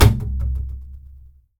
INSTRU STRING Double Bass Hit Bow Impact Muted String Bounce; Articulated Sounds; Special Double Bass Fx